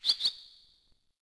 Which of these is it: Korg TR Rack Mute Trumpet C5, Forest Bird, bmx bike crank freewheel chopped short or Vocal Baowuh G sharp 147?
Forest Bird